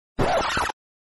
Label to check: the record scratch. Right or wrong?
Right